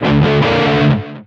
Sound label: Pickup Guitar Riff; SFX Kit; Pickups